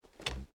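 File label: footstep armor; smite; kuzenbo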